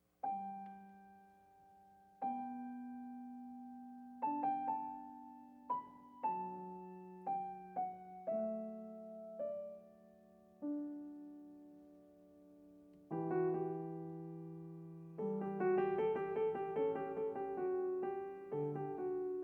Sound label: Goldberg Variations BWV 988 Aria; Bach